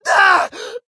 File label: scream, Screams; Voices; civ13; civ14